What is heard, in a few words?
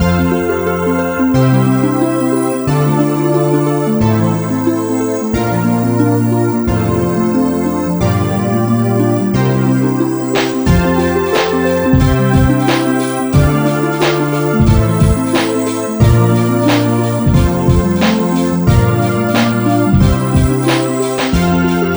Stage BGM; hichi and friends